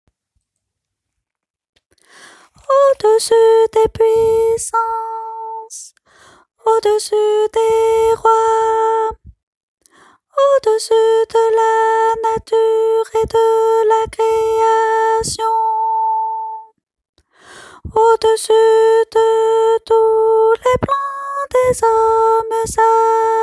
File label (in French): ténor